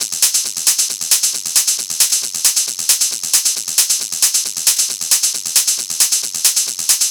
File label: Hat Loop 135, Techno